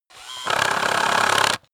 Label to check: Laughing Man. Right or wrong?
Wrong